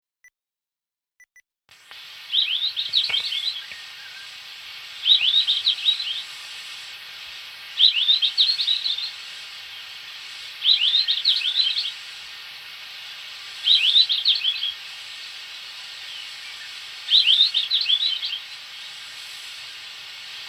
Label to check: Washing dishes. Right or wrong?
Wrong